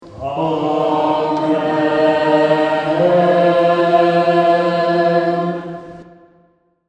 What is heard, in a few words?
Amen